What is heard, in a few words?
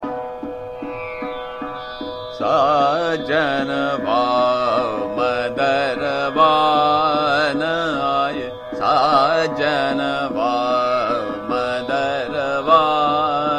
Chota Khyal - Trital, Abhogi Kanada; Sajanwa Mandarwa Na Aaye